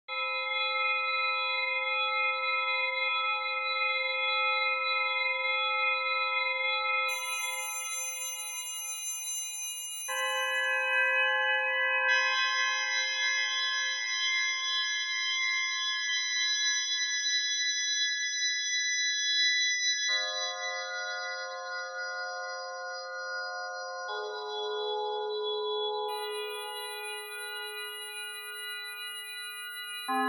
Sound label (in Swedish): orgel